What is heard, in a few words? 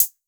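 808 closed hi hat; 808 SOFT; Wizoo - Platinum 24 Electronic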